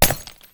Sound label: break glass